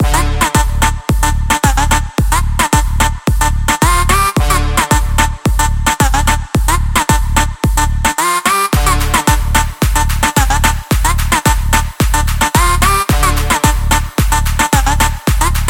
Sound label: Full Loop